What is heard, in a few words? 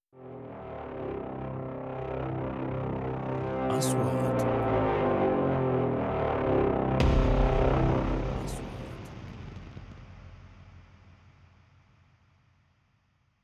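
3D SFX Trailer; مؤثرات تشويقية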